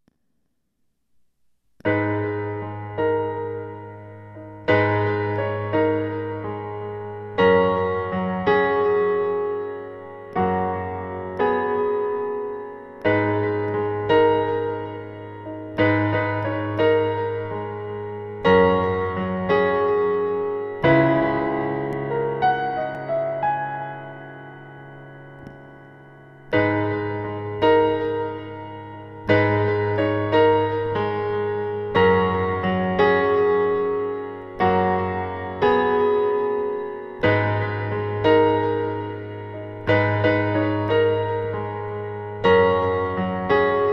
oct yamaha dig piano

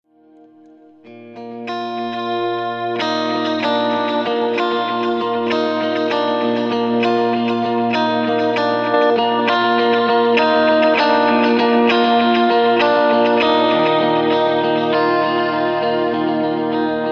boosting ac15

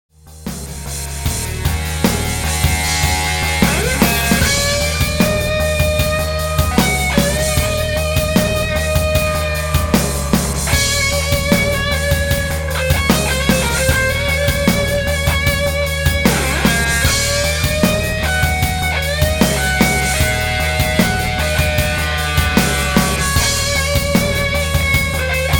Bullet Solo

